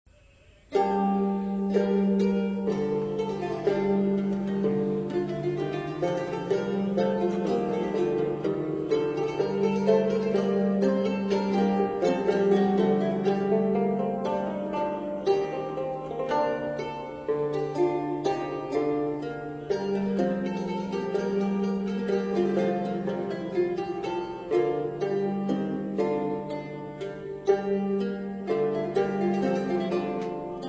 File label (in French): luth; instruments